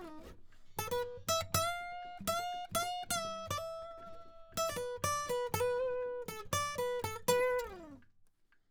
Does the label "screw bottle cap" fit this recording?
No